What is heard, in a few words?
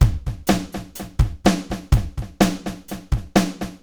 80s delay Akai XE8 (125BPM), cyborg beats